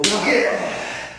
hit; freak fortress 2; aniki

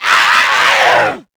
kid zombie attack; nmrih; childrenofthedead; zerosiki; slender

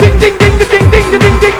ding ding ding loop; slender

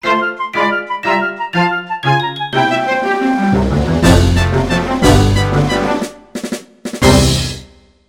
march, music minigame; tf2ware ultimate

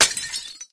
ceramic destroyed; zombie riot; btd

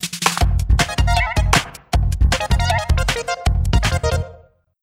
dance moves common; taunt; fortnite

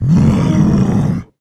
alert, male zombie; nmrih; zerosiki; slender

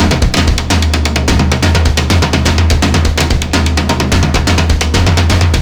drums, zombat horde; szf

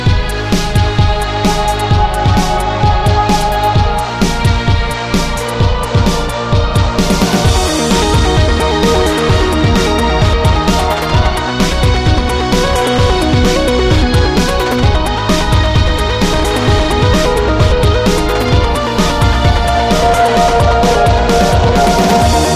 blues, music minigame; tf2ware ultimate

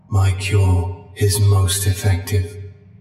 jeers; 049; scp sf

